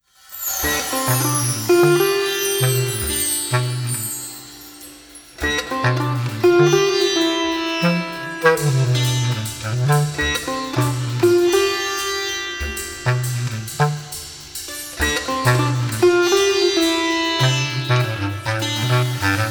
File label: Funky Sitar